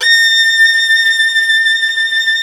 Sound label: Synclavier Strings; ILIO